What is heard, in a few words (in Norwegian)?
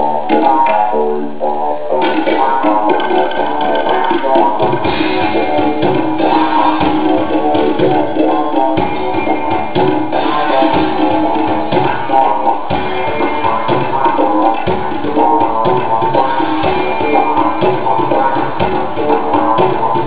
Dans; Dinner